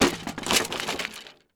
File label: Crashes Wood Debris; AdobeCC